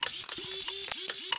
ROBOTIC Transformation Insect Servos Clicks Digital; stereo